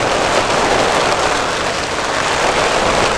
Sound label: roll ice, terrains; Tony Hawk's Project 8; XBOX